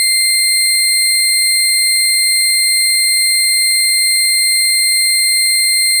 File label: FM Sawtooth DX100 C7